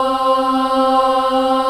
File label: C3 child ah, choir; USB Soundscan vol.28